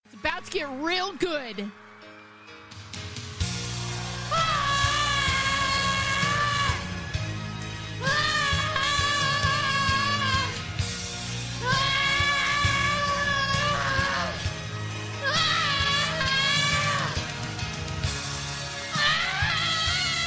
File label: ahhhhhhh